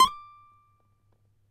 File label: CAVAQUINHO SLIDE, Guitars